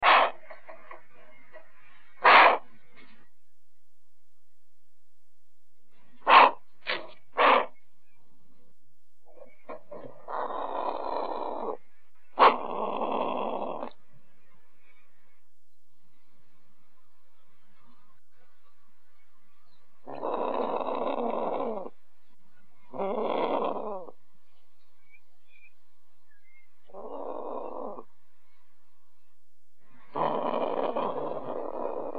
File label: Australian Bustard; brolga and bustard; family